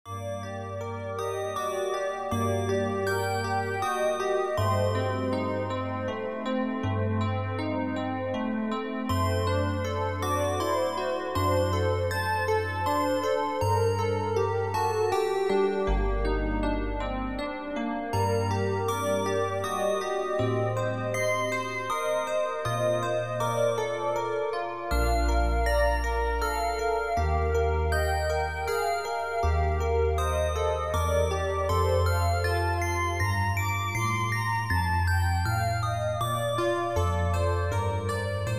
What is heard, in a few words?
Sonata For Flute